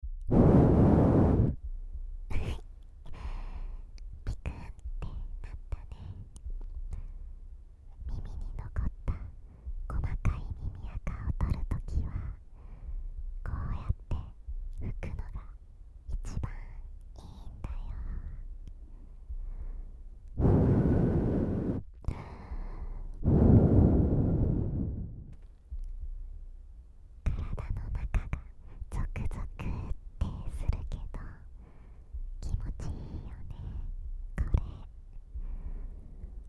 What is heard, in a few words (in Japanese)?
お姉ちゃんのお耳ふーふー（右耳）